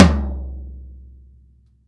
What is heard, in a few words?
TOM E, Drum Dry; East Collexion; Drum 1